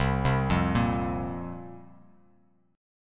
C 7th Suspended 4th, Bass Standard; C7sus4 arpeggiated

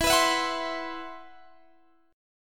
C Major 7th Flat 5th E

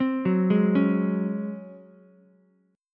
Db Major 7th Suspended 4th Db down Ukulele; arpeggiated; JGuitar

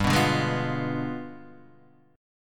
D Diminished 7th G

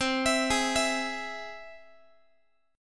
C Augmented down Guitar; arpeggiated; x,20,19,18,20,x

